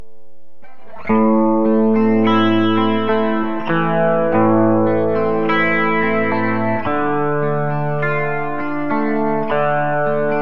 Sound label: dragon bridge clean specpick; burny; fugly clips